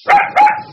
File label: dog bark